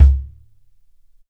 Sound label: roomy tom sound c sharp key